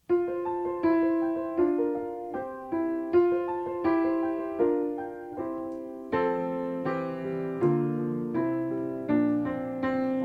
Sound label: we are a rainbow instrumental